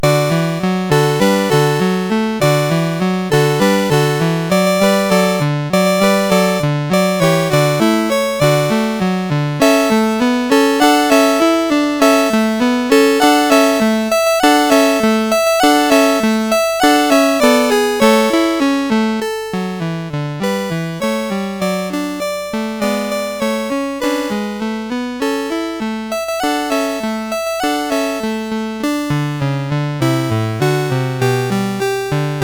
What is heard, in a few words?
Hallelujah Chorus, CSG8580; Christmas